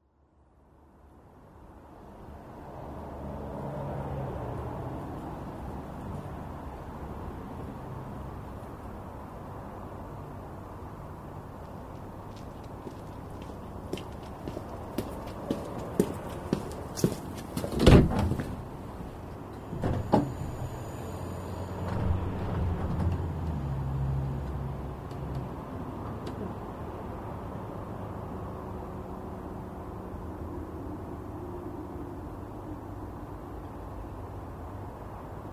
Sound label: walking - cars; fieldrecs